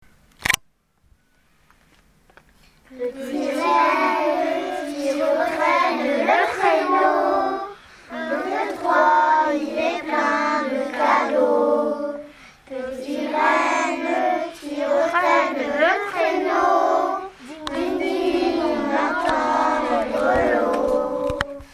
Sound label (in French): chant de noel